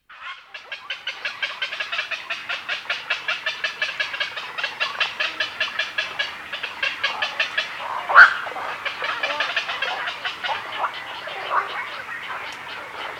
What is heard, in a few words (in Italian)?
airone bianco maggiore